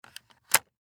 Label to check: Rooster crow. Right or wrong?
Wrong